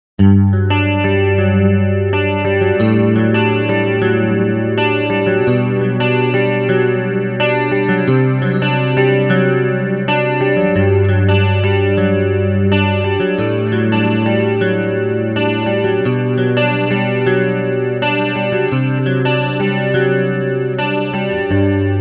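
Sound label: Chorus, Transamp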